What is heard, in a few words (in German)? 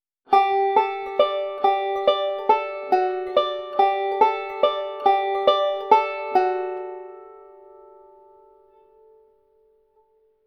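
up the neck lick auf d, banjo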